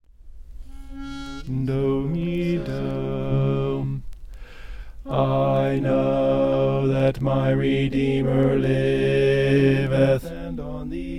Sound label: bass; i know that my redeemer liveth